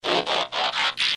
变身; LockChime; 特效音; 变形金刚

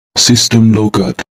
系统注销; LockChime; 电脑

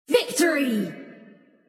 胜利; LockChime; 王者荣耀

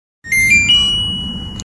空调开机; LockChime

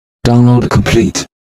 下载完成; LockChime; 电脑

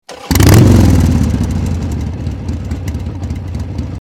哈雷戴维森启动; LockChime; 特效音; 智能汽车

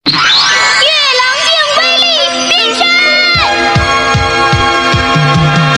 美少女变身; LockChime; 特效音

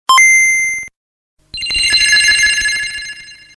GBA开机; LockChime; Tesla x 极氪 x More

